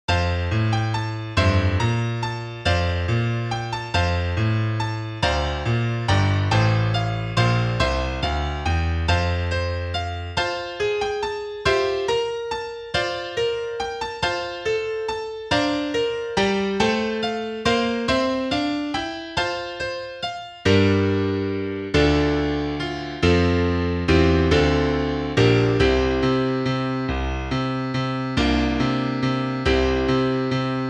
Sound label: Gloria Patri Piano; capella; magnificat